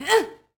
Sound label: Boxing Female Punch Grunt